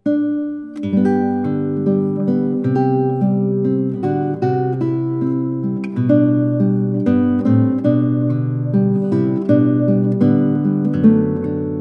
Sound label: Irish Meditation, wedding songs